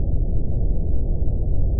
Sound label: own sub; Piranha